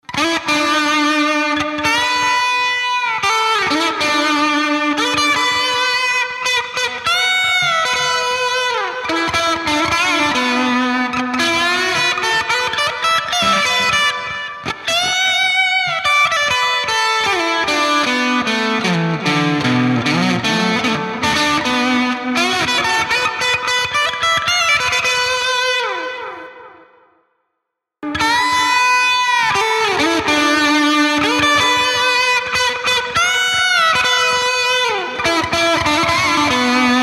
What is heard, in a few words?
Sunface tone, solo. Gain at 50%. Sundial 50%.